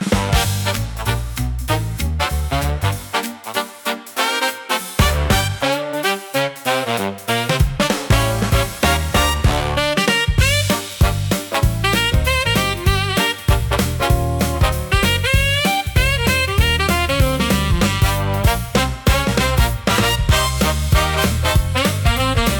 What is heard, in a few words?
Brass Pocket Shuffle, electro swing pop